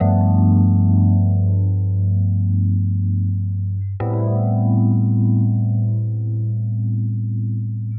120 Rhodes Chords G, Keys, Nu Jazz House; PowerFX

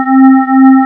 SQR

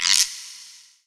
Guiro Long; realdrum

